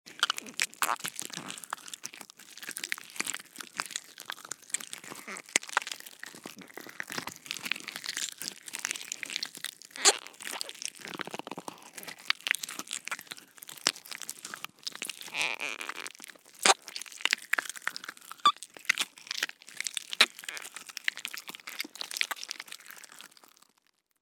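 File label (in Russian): пережевывание гадости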